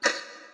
clock

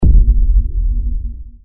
drop

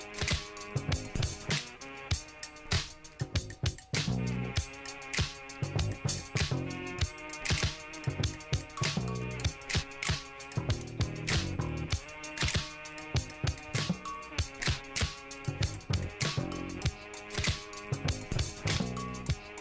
test loop; hns; hnsru overpass

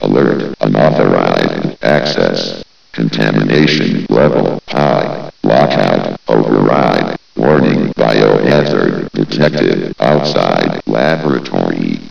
alert Lab; zm dust MD

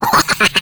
skelly medium

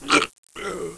die

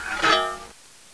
skull axe deploy; knifes; expendable knifes

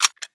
tec9 clip out, weapons

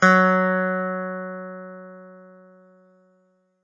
chime

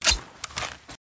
Out, weapons

